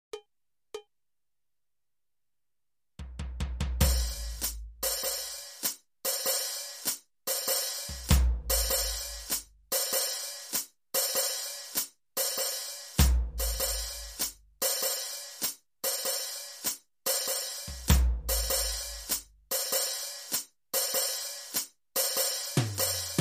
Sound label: Stripper Burlesque Loop